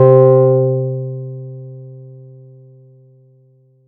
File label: Chord; ModelCycles